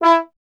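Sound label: bass trombone E; Miroslav Vitous; Solo Instruments VOL-1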